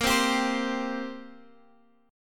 G Minor Double Flat 5th A sharp chord

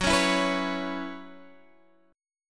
B Suspended 2nd Suspended 4th, Gb, chord

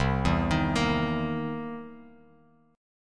C 7th Sharp 9th C Bass Standard; arpeggiated; JGuitar

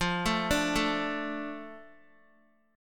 F 6th F down Guitar; F6 arpeggiated

